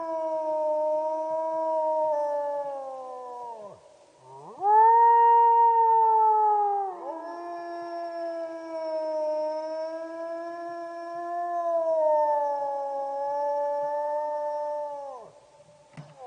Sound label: howl